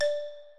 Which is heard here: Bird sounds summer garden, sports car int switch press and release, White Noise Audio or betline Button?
betline Button